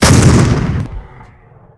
20mm fire; WAR

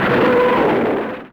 katyusha; WAR

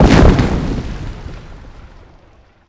medium impact generic; WAR

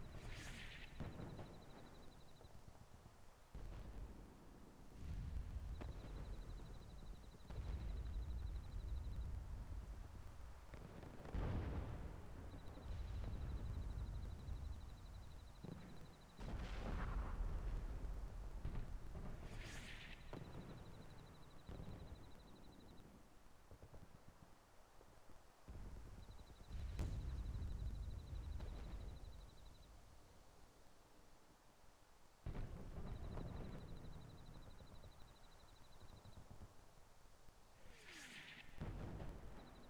no battle; WAR

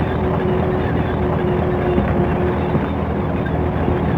tank loop; WAR

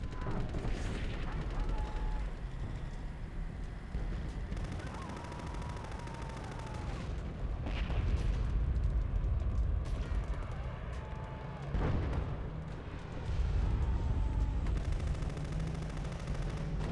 destroyed city; WAR

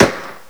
mp40 attack; WAR

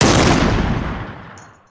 axis anti air; WAR

